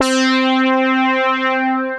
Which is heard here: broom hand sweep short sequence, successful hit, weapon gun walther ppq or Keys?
Keys